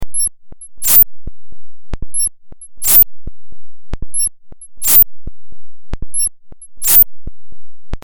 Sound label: fm7 patches